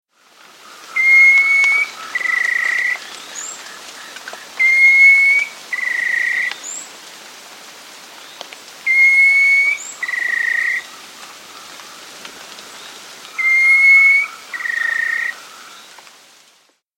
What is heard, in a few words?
Rufous Antpitta; S. America